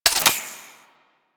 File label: Modular Secondary Clip Mech, Weapons